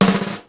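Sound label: SNARE ROLL, Live Snares; PlatinumPhattCD1; E-MU Producer Series Vol. 8 – Platinum Phatt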